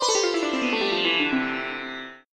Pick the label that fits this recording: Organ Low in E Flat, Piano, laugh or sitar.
sitar